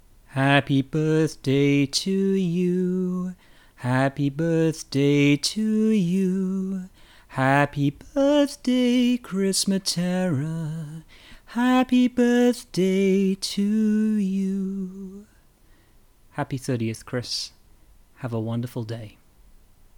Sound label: happy birthday, voice